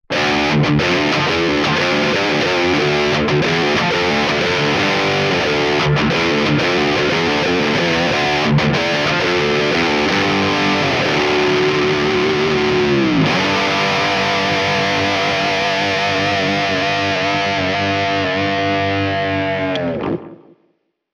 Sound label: Mesa Boogie High Gain Rhythm 2HT; Neural DSP